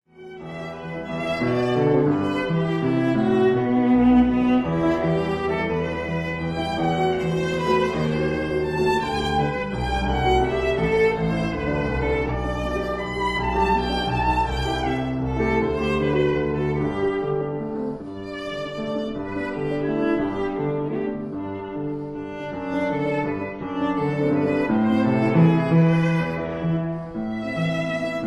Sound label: Romanzen For Viola Piano Allegro con passione; Kiel; Op 69